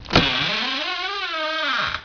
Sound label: coffin open; vampire demo